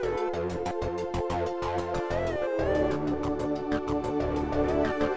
Keys FX; Multitrack

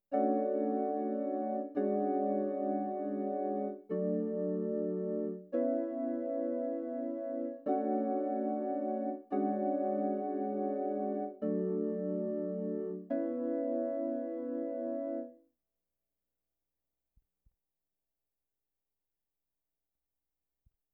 Rhodes

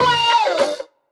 Wow wet

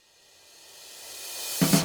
Drums

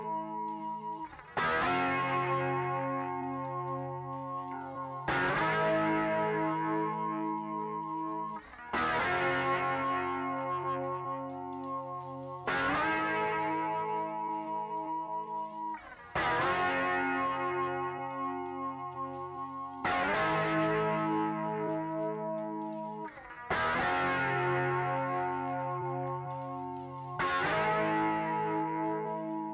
Guitar Coda Chords Double

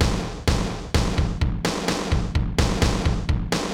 drum

